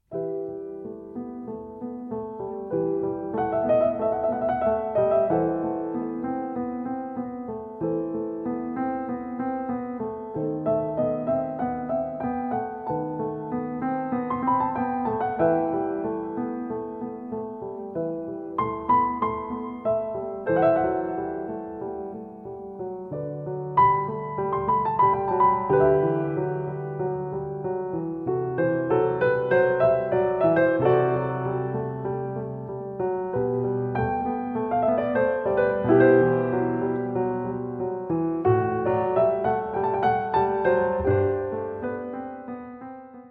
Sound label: Prelude and Fugue in E Minor; No. 10; BWV 855; J.S. Bach